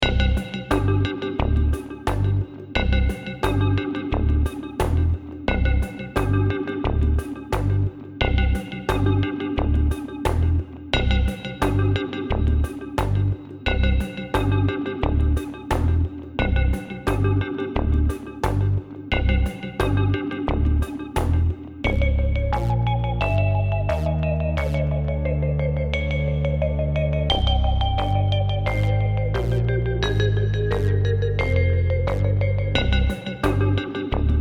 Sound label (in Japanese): 怪しい洞窟; 暗い; 不気味; 怖い